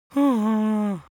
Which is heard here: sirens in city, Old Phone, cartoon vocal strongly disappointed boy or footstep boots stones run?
cartoon vocal strongly disappointed boy